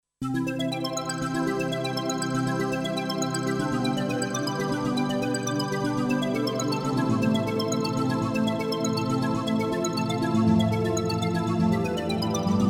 Meditation Pad Growing Plants; NADA

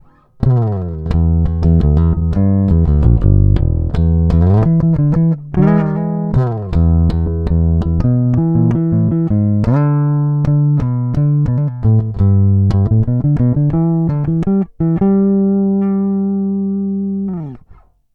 Neck Finger

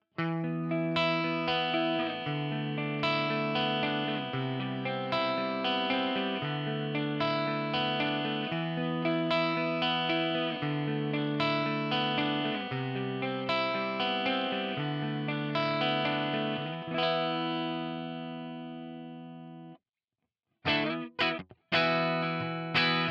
Jackson SL2 neck CLEAN

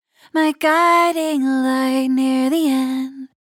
Vocals DRY

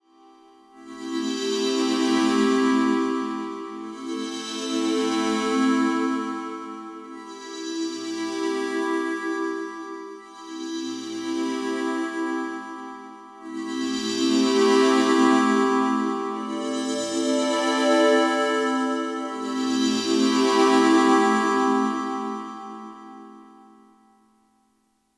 Sonicware ELZ_1 8BIT Wavemem Synth Morph

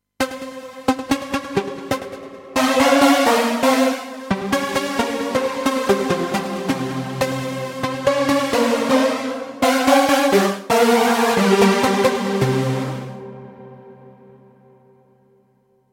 Technoid Lead

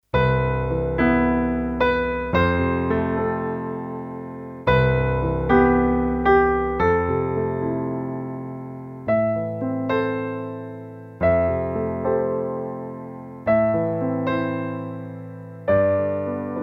Alesis Prestige Grand Piano

